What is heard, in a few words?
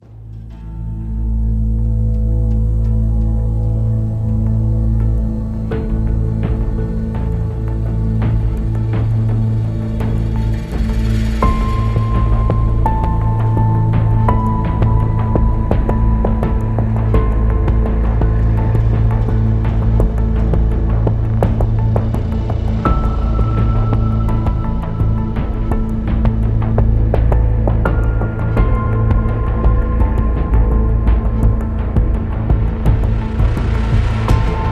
Political Thriller